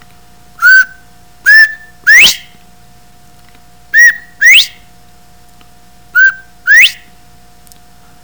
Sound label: bobwhite mating call